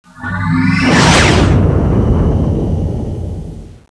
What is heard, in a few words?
warp engaged; armada2